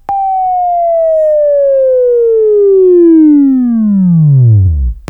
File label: Buzz; Labview-Program; Sweeping Training program